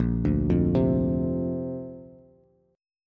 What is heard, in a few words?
Bb Major Add 9th, Bass; 6,5,3,5; arpeggiated